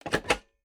SFX Mechanical Lock Success; rebel cops 2019 gamerip

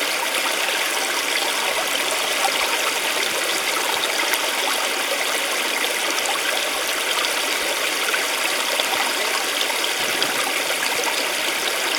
water river

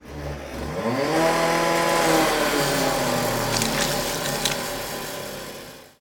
Saw Execution; Estate

